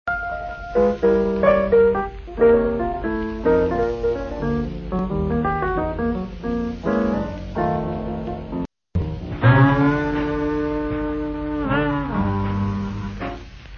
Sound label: Blues